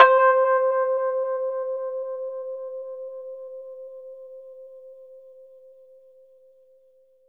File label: Rhodes fast C, 3D Keys; 3D Sound; 3D Keys VOL-1